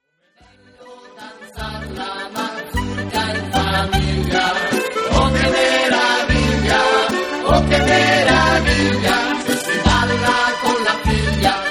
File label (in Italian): mazurka